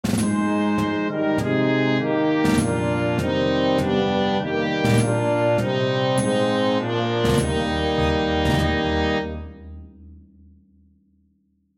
Trumpet; While Shepherds Watched

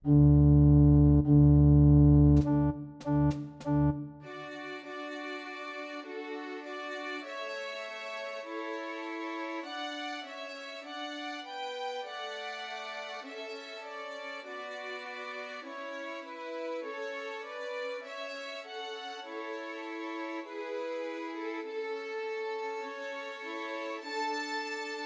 Trios for Three Violins; O Come All Ye Faithful; Christmas

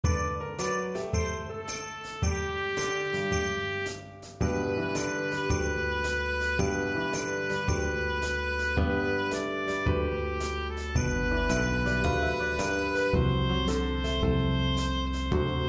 Oboe Track with Melody; Jingle Bells

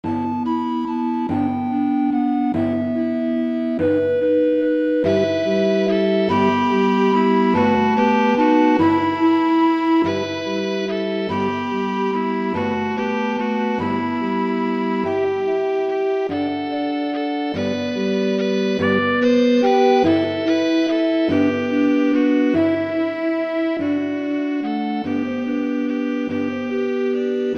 Viola Track with Melody; We3Kings

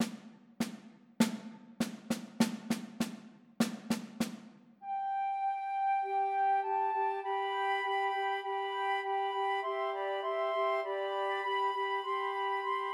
Christmas Trios for Three Flutes

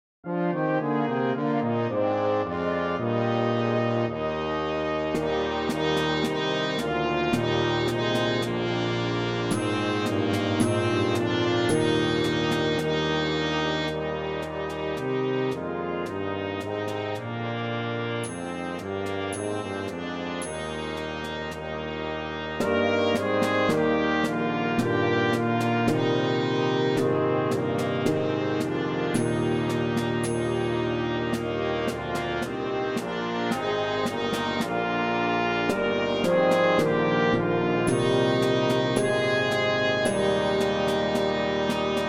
Trumpet track with Melody; Good King Wenceslas

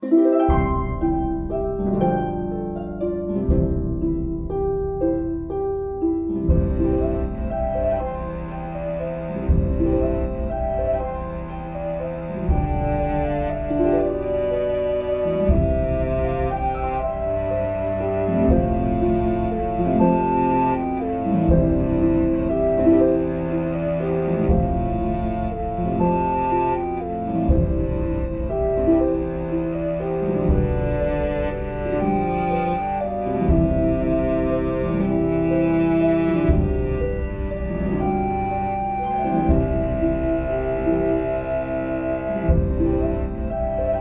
Cello track with Melody; Silent Night

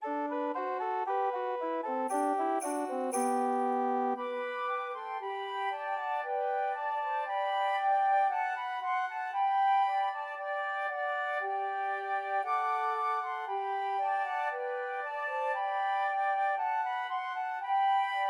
Trios for Three Flutes; Deck the Halls; Christmas

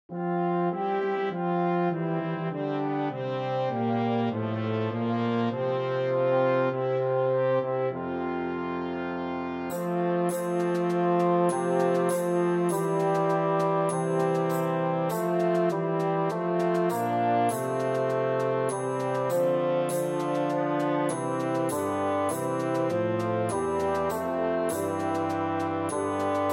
Tenoroon track with Melody; O Come All Ye Faithful

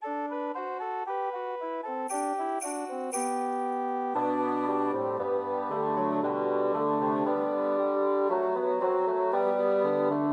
Deck the Halls Christmas Trios for Three Bassoons

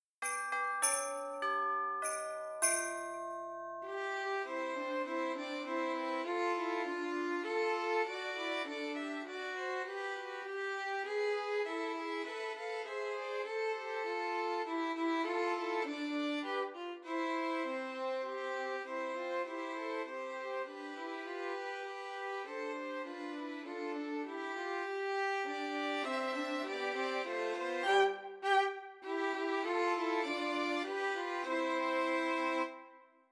Trios for Three Violins; We Wish You A Merry Christmas

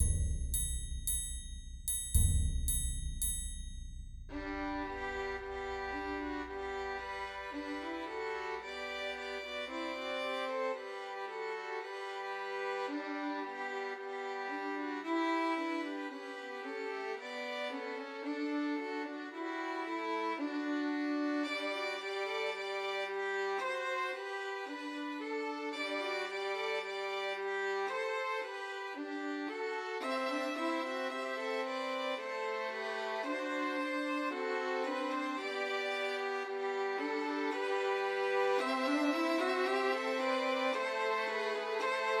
Trios for Three Violins; Hark The Herald Angels Sing; Christmas

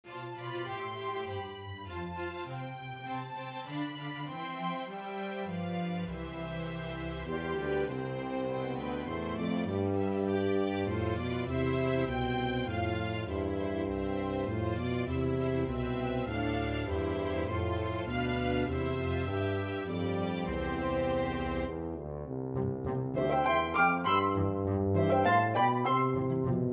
Tuba Track with Melody; The First Nowell

